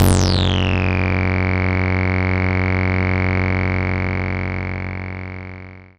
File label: Memorymoog Resonator